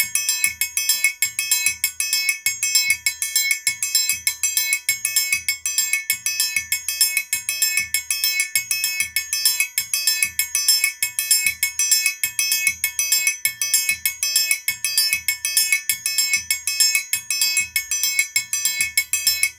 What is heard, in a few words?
baiao 98 triangulo; gen 04 stems samba baiao